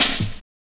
Hard Stepz Cut, Jungle, Drum Loops; 165.5; Zero-G Jungle Warfare 1, 2 & 3; 90 s Sample CDs

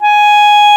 clarinet G#5; East West; Global Sounds

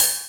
Ride; Equipped Music; Loungin House

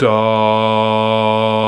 VOX, Male Scats

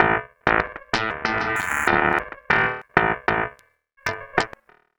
El Distorto, Basses; Sonic Foundry (Sony Creative Software); Modular Electronica

